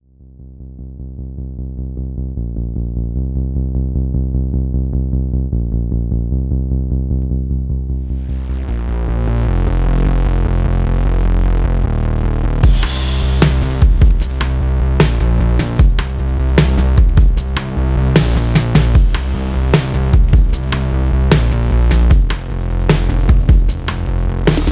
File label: square bass; RC-20 Retro Color; square bass maker